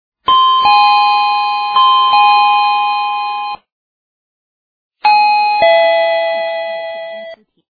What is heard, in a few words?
dingdong doorbell